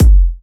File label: Bassdrum